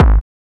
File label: Conemelt, Kick Drums; Zero-G; Soundclash